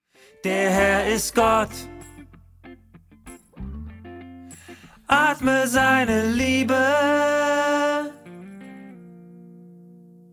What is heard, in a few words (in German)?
Lern Bass